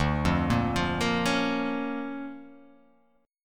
D Minor Major 7th Sharp 5th, D

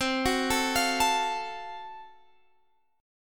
F sharp Minor Major 7th Flat 5th C

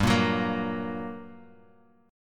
G Minor Major 7th G, Guitar, Half Step Down

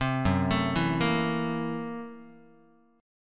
Gb 7th Sharp 9th Flat 5th Gb Guitar; arpeggiated; Gb7#9b5; 3,2,0,2,2,x; JGuitar

